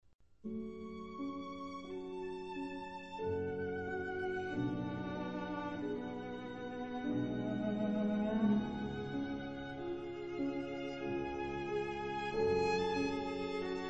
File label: trio in B; loeillet